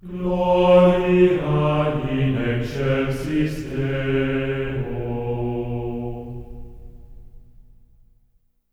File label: GLORIA B3, Symphony of Voices; Spectrasonics; GR GLORIA